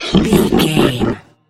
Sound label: troll monster laugh